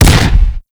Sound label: Double Barrel Shotgun Fire Shot; Warframe